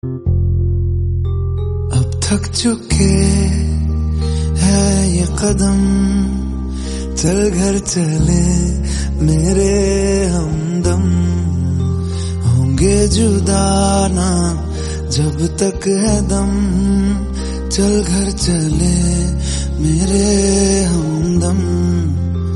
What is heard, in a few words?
hindi song tone ringtone